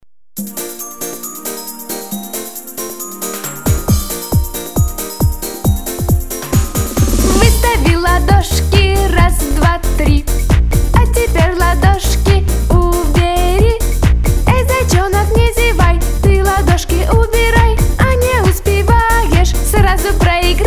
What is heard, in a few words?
Duet